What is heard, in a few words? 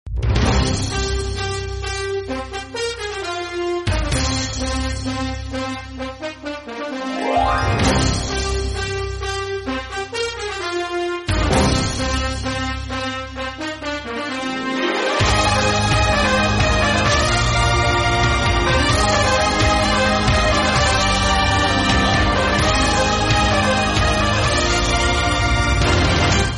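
tamil background music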